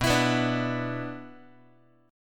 B-Minor Double Flat 5th, Guitar; Bmbb5 strummed; JGuitar

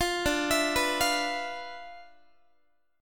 D Minor 6th Add 9th D, Guitar; Dm6add9 arpeggiated; 20,22,19,21,21,x

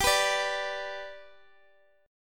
G Major down Guitar Open D

